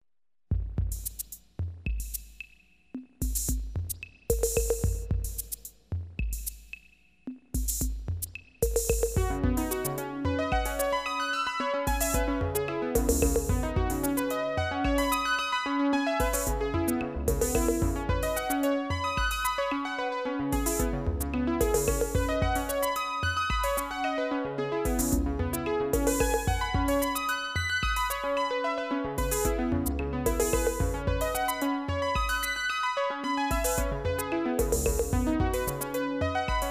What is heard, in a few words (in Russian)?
melodiya na sintezatore s udarnimi